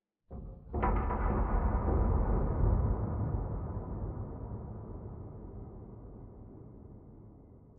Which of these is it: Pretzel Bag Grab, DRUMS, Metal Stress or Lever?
Metal Stress